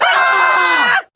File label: scream male and female; ooh ahh